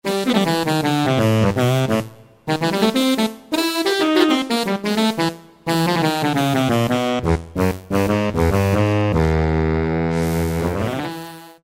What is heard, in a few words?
Power Sax, Roland JD-990; Factory Patches